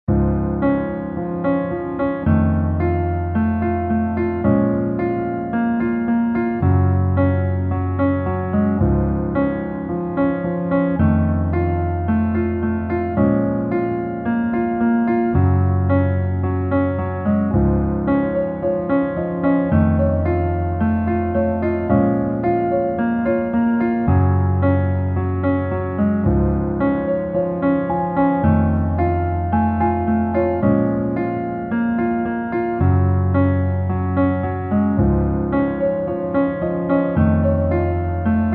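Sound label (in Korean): Piano version 멜로디 MR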